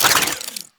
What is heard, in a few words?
Idle Spin, Weapons; Warframe; Parazon